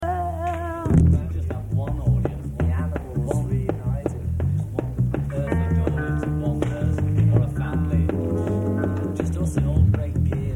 Improvisation

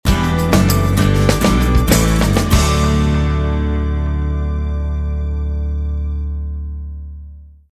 Pop Band Bumper; elements

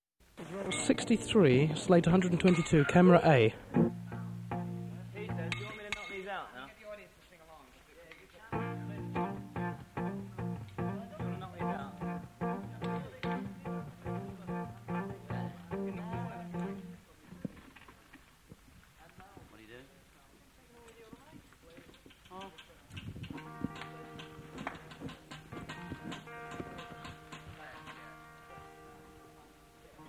Improvisation

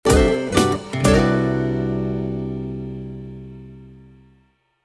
Friendly Bumper; elements

